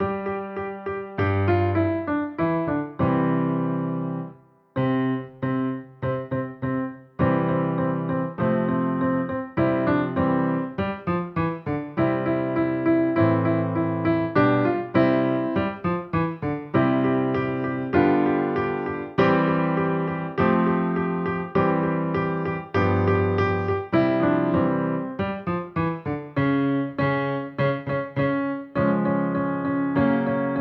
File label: backing track